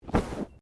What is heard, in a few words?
Bodymovement Clothing Slide; Body movement foley to simulate falls and deaths; Dressing; Human